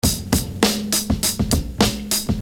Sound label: Beat, Hip-Hop Street Beats